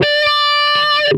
dist wah D5, Guitar Special, distorted wah, notes; Future Music 10th Birthday 2002

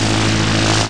GOOD propellor; PUZMANIA

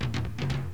error

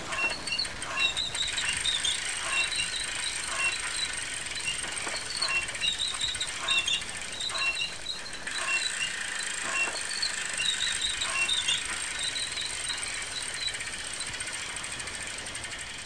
squeaky

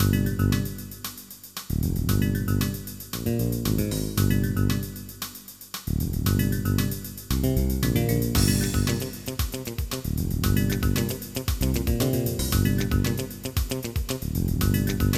Game Music

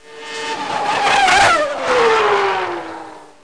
Slow by, Bike; CASTROL

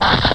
Hit

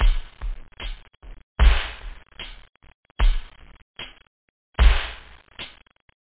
pop beat, loop; da capo vol1; instruments

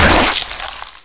limb loss, mummy; Hexen II

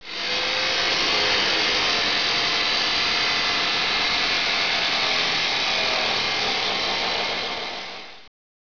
grinder

